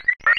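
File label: sphere blips medium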